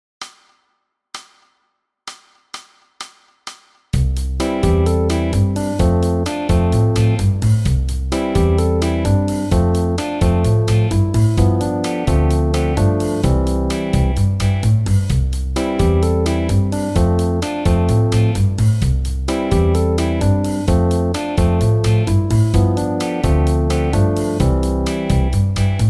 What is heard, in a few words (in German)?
B Teil, mit Bass